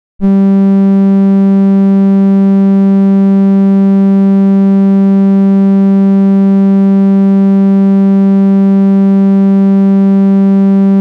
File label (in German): vl G (rein), tuning notes